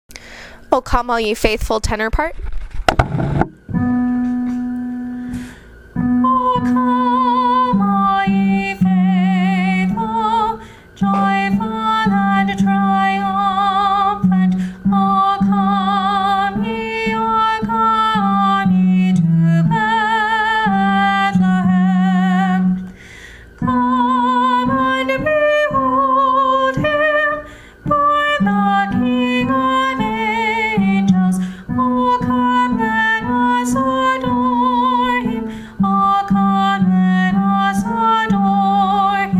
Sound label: o come all ye faithful tenor